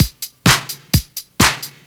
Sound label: Straight Loop 128 BPM, House; Best Service; House Definition VOL-1